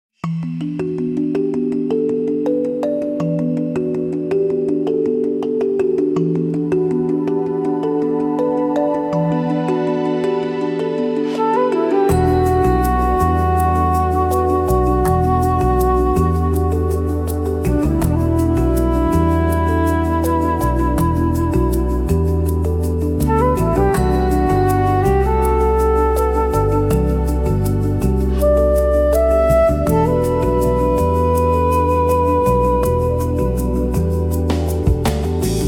voices of the green realm a cinematic forest prelude; jungle-ambience